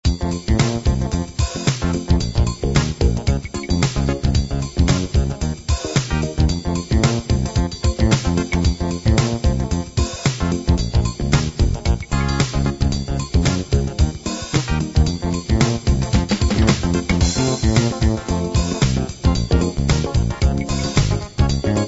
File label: jazz hiphop